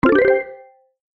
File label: Correct Answer